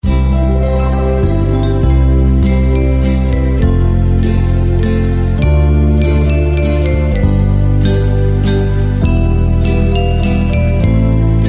ringtone; marry christmas

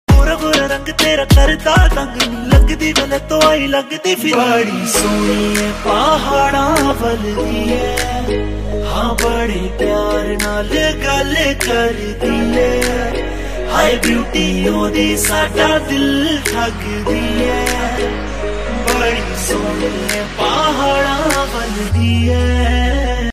ringtone

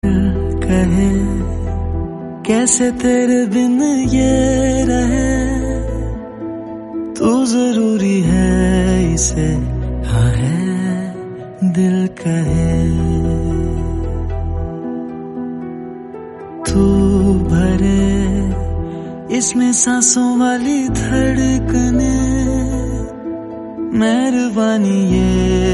song ringtone

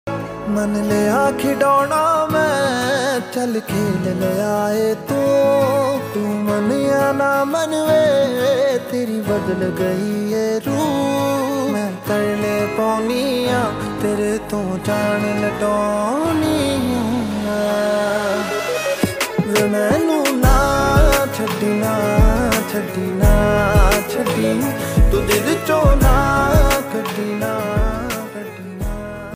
new sad punjabi song ringtone

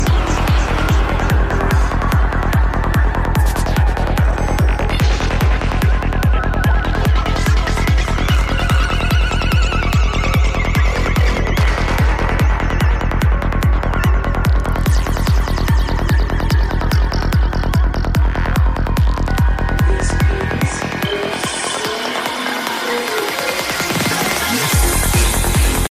abduction ringtone

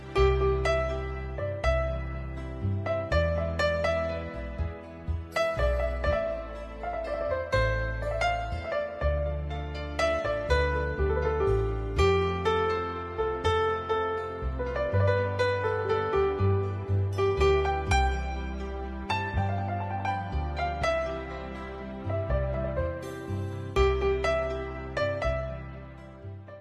instrumental ringtone

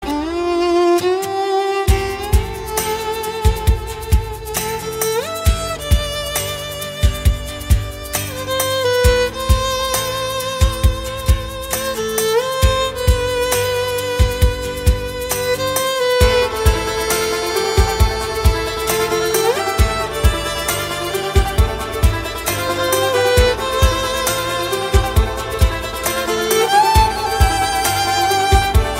violin ringtone

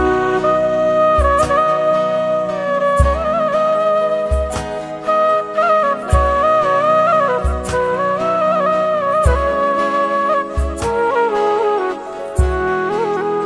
flute ringtone

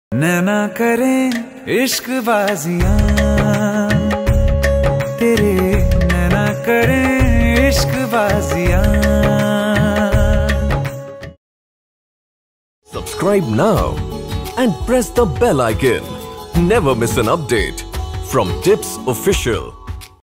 romantic track ringtone

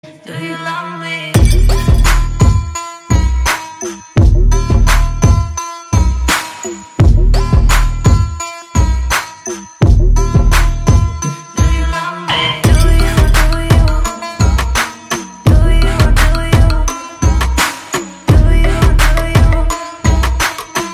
instrumental

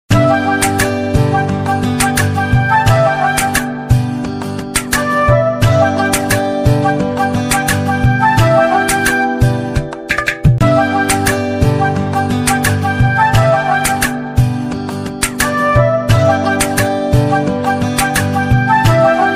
instrumental music ringtone

